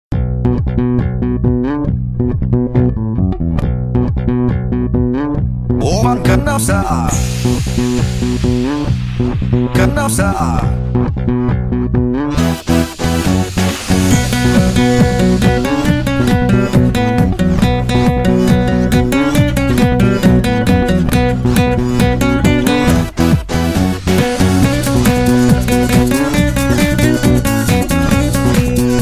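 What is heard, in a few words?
gitara, guitar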